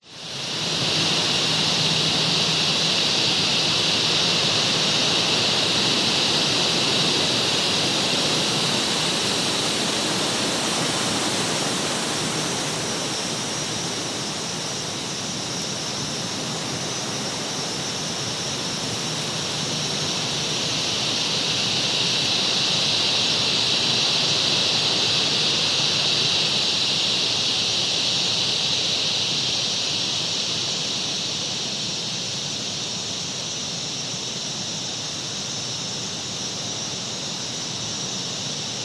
nature forest amb cicada waves strong wind tree tops